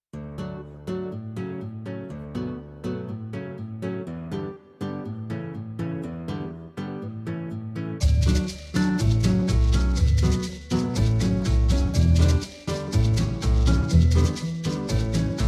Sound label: Instrumental; barfii